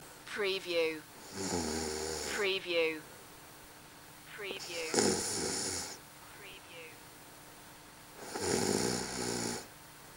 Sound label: Snoring, Female Snore